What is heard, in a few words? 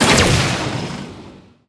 rocket; brawl stars; robo rocket